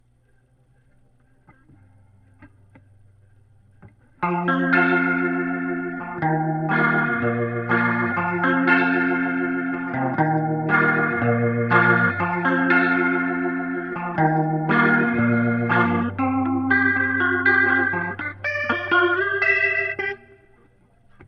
Leslie gtr

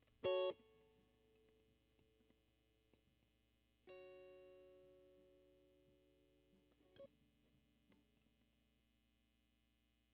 GTR

